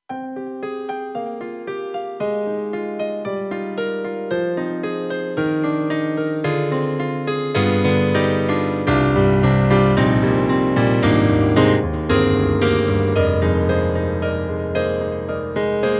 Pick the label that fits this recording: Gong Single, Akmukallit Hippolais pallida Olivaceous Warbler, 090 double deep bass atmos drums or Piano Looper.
Piano Looper